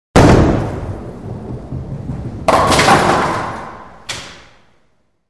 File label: Bowling Ball Rolls